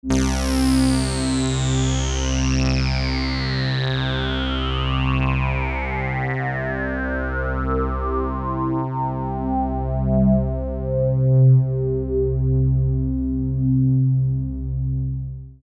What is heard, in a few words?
downsweep; opx reaktor; obx